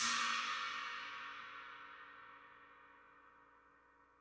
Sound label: China High Low Vol